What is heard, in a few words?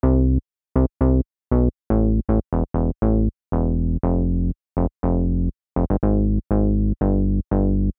Bass, 120bpm; Zero-G; Nu Disco; Nicengroovey C